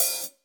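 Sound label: hi-hat, Drums; Bob Clearmountain Drums I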